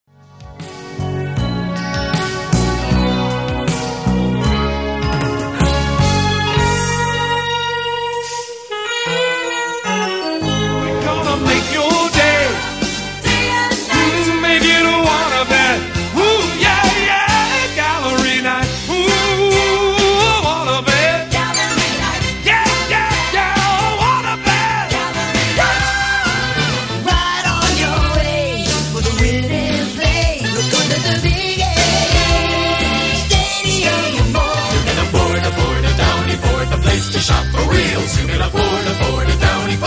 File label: Jingles